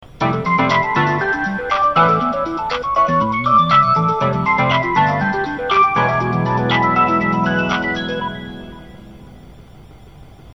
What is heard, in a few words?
Tsubame chime